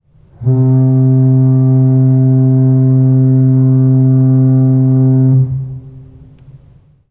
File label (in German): nebelhorn queen mary 2